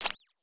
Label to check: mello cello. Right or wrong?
Wrong